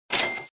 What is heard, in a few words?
kaching; Puffer Popper